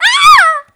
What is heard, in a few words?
death; player